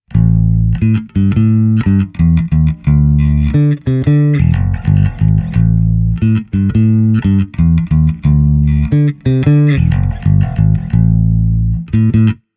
toob 12b gk mb200 jazz bass, 1980s Jazz Bass; The sound clips were played through the GK MB200 and recorded with a Shure SM57

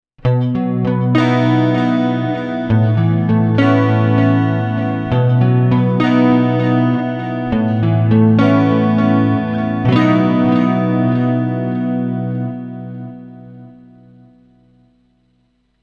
Fender Stratocaster – detune – delay; recorded direct via the G3’s xlr-output

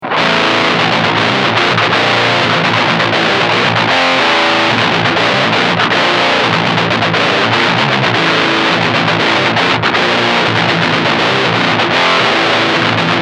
Röttweiler on, voice off, seven-string Schecter; clean amp setting; Miloš Berka

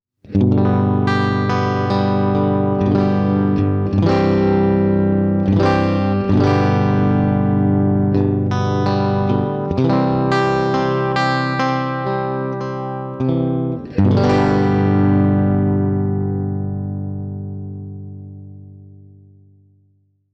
bluetone load box line out clean

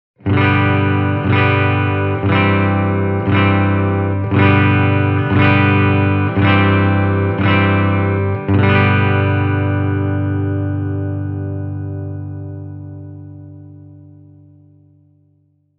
ltd ec black metal clean

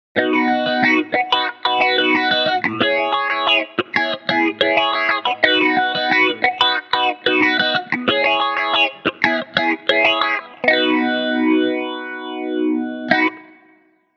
Tokai LC-85 – phaser – Blackface-style

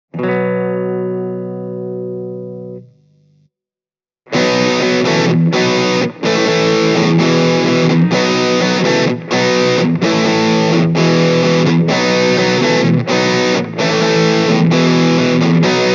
boss sd 1w half drive; Boss Waza Craft

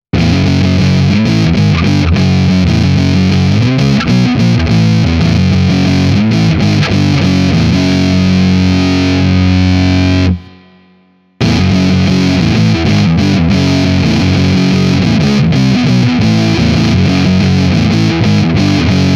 metal, Metal-distortion, guitar; ML-Factory LP-style kit with ash veneer top

